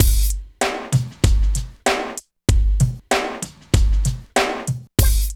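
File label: drum loop; East West - Phat & Phunky CD2; 90's Sample CDs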